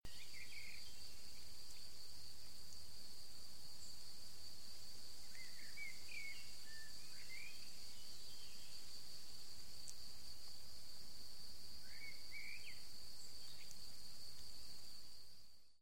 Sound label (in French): Ambiance de champs